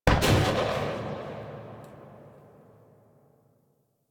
Spark Rifle Tail; Grineer

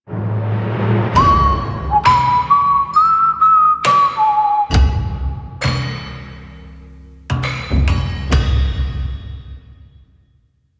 Menu Cue, Music; Solaris United; Conservation

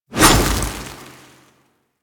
Hover Whizzby Angry; Enemies; Warframe; Warframes; Pax Duviricus

